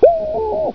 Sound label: disaster; ambience